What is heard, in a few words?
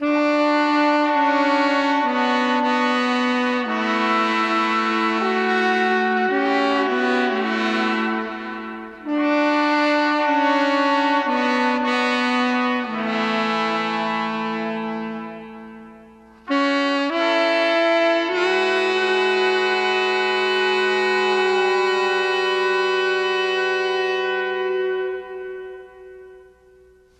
sax sad, Loops